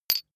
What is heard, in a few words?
medium metal impact medium short